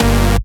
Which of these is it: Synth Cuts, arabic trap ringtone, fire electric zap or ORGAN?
Synth Cuts